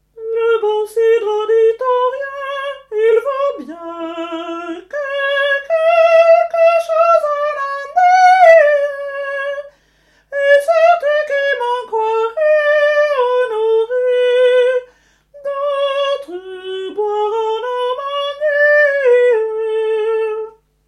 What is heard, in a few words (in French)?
le bon cidre tenors